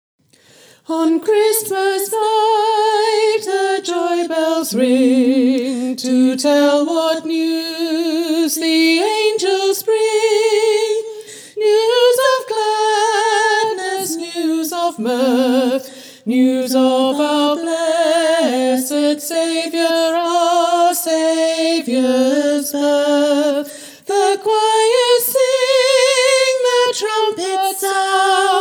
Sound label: ALTO